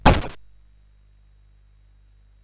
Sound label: Paddle Mania, CSG8580R5